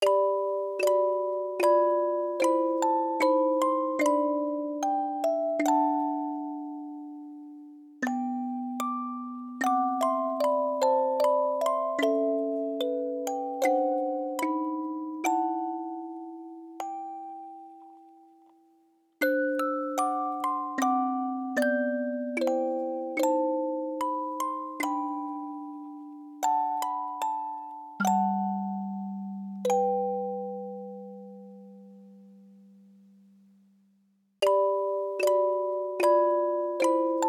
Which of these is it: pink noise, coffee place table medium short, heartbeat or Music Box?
Music Box